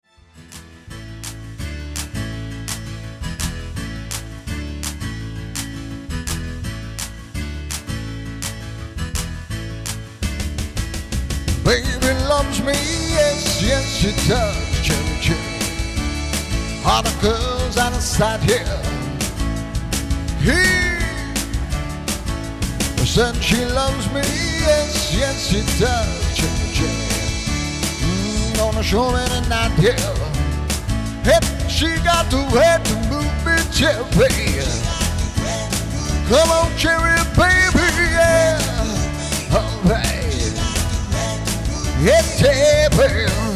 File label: Tribute Band